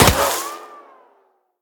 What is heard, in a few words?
Gunshot Miss; The Town